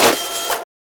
Shop Cash In; FREE SFX PACK VOL 3

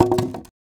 Drop Wood; FREE SFX PACK VOL 3

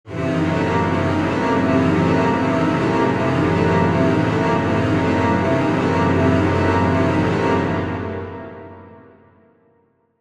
Chase Strings Mid, horror strings

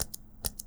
Mech Pencil Click; Books, Paper, Writing

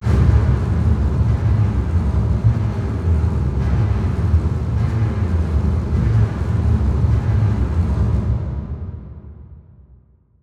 Adrenaline Strings Low, horror strings

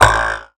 spring

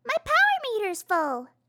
power meter full

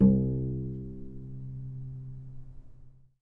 C2 Pluck, novice cello

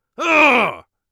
angry, Warrior Voice; Warrior Voice Pack

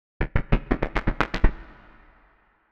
Footsteps - Fast; Horror Sound Pack

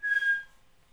whistle; abstract; Free Sound Assets

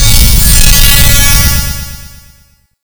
Retro Electric

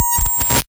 Signal Scramble; FREE SFX PACK VOL 3

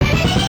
hit jingles; kenney musicjingles

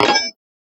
Shop Not Enough; FREE SFX PACK VOL 3

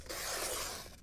Cut Paper, paper cutter; Micro Pack

